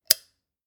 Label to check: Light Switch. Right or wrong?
Right